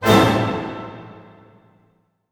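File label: A minor fortissimo, orchestra hits; Sound On Sound - Peter Siedleczek's Orchestra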